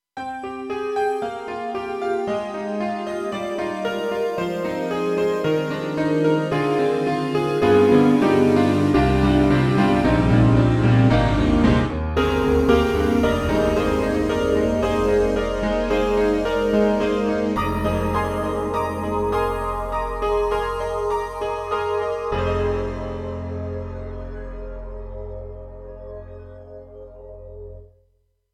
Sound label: Sparkling Piano; KORG M3 XPanded Program